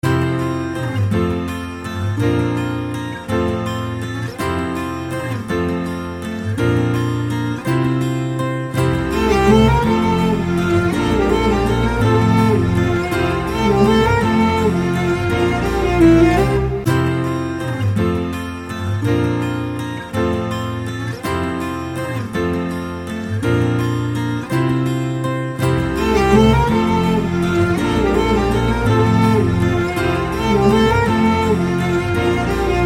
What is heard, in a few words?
Instrumental Tone